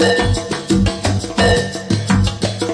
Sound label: loud blop mideast, drum loops and breaks, 174 bpm; 161 to 180 bpm